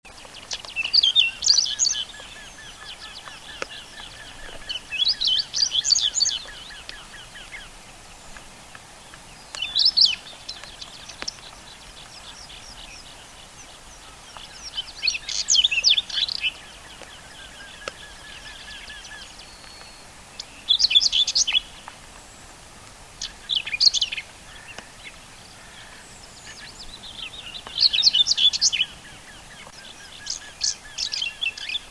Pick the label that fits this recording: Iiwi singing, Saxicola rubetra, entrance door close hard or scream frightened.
Saxicola rubetra